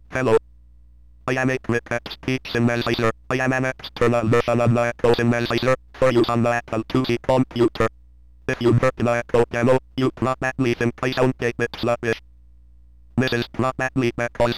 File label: cricket